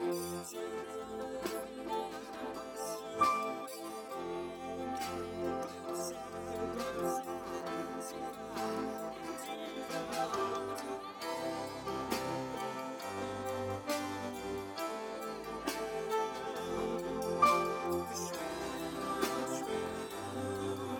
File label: piano; SiSEC11